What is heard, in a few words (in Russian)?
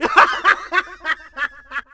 smeh